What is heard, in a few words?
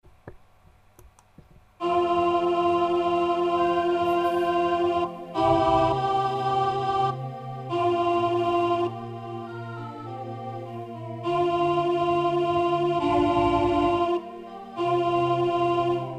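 mezzo-soprano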